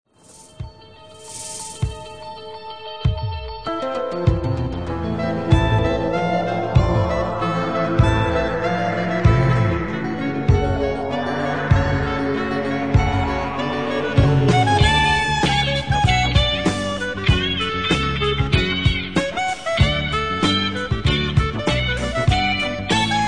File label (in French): ricochets